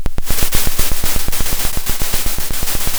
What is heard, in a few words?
Sound of a drum roll